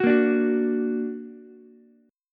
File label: A sharp Augmented, Ukulele; A#+ strummed; 11,10,10,9